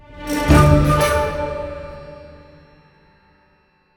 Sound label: Master Build Complete; lego horizon adventures